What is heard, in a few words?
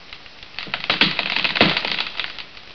rock; PCI audio card; C-Media 8738